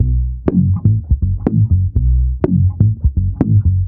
IN HOUSE, Bass, 124 BPM; Sampleheads; Ultra Freakin' Bass

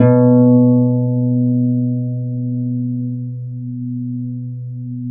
B2 HARP; Complete Harps; USB Soundscan vol.62; AKAI

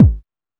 Techno, EMU XL-7

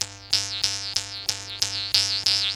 KHOMUS

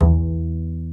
PITZ STRINGS

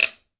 guiro; AKAI S6000 CD-ROM - Volume 5

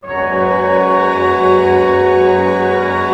Pentatonic, Chord Cluster; ORC PENT; Roland L-CD702

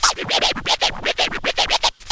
FX scratch

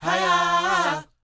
Vocal, SOUL 100; ILIO; Vocal Planet VOL-4; R&B & Dance

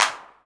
clap, 135 BPM, drum & bass; Ueberschall; Drum & Bass Flash Trackz

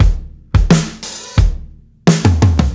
NO HH, breaks, drums; Basement drums vol 2

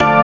SYN ORGAN, dance synth; Sound & Vision - Gigapack I CD 2 (Roland)

